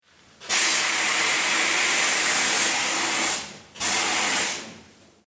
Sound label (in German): Sandstrahler